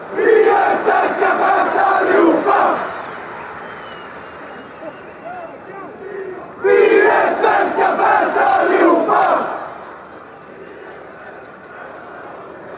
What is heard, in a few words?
we are all swedish fans, fanchants